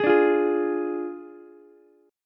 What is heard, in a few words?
D Diminished D, Ukulele; strummed